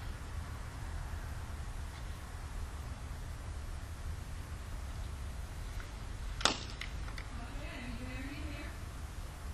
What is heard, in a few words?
EVP